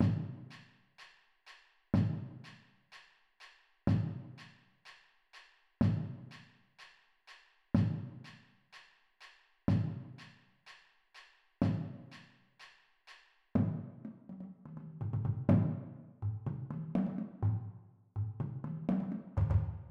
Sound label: bass, drumline